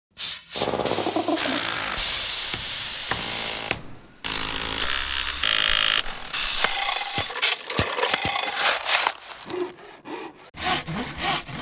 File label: tools